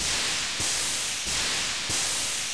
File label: steam